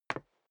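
step dress boots wood parquet single stair down, footsteps; schritte; menschen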